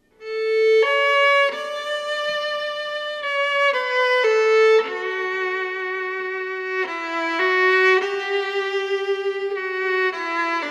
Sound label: acoustic; fishman p3